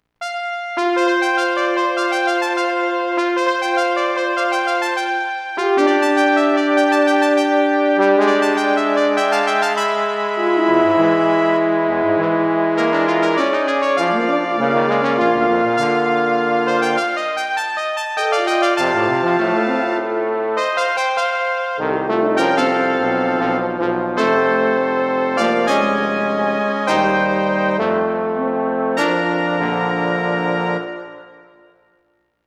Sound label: Fanfare